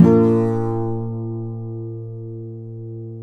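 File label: nylon down major B; Spectrasonics; Hans Zimmer Guitars VOL-2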